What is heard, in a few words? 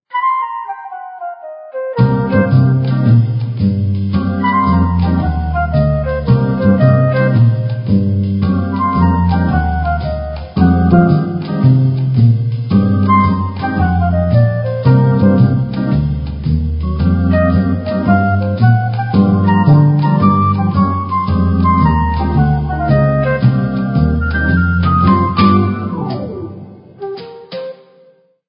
Tender Jazz